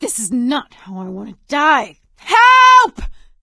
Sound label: ledge hang end; Left 4 Dead 2; producer